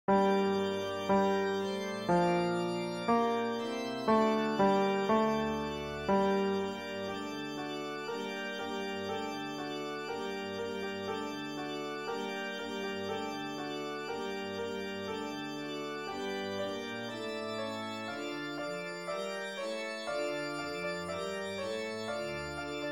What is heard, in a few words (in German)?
nun komm der heiden heiland maenner